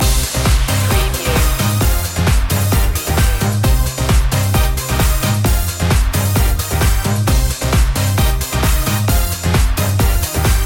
satin bullet, Uplifting synth and club groove. 132 BPM.